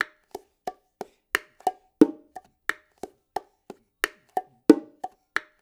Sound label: loop, bongos; InVision Interactive; Percussion Slam